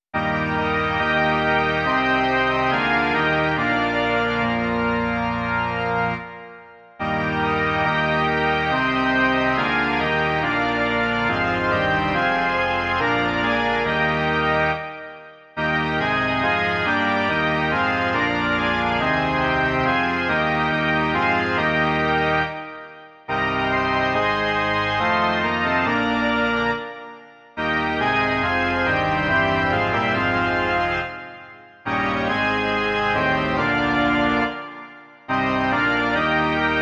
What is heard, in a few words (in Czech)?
varhany; V tebe, Hospodine, doufám